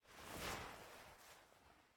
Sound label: Player Sit; MiSide